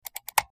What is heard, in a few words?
Highpitched Mouse, mouse